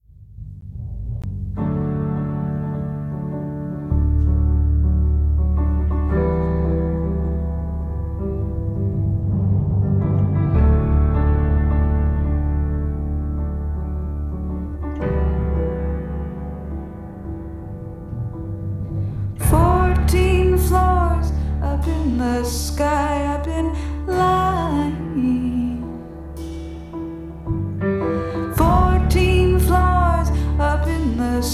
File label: riff